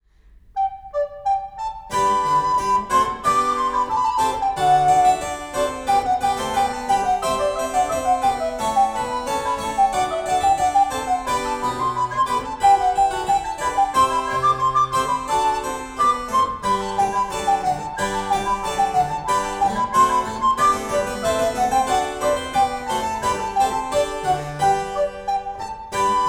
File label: Duo Main Nearcoincident; Dual Array Wet Dry